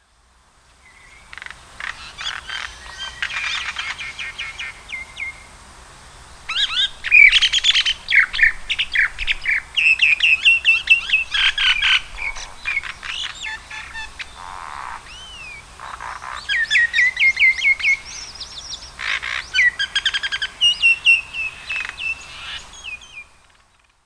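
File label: Common Myna; yonaguni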